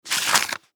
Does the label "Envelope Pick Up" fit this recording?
Yes